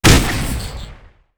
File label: explode; g-toilet nextbot; GToilet SKIB FANMADE